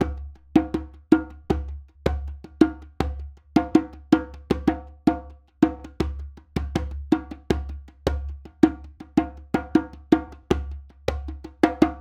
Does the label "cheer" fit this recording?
No